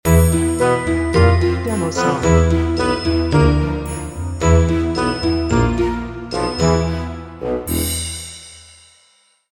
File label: Tricky Adventure Gleeful Oboe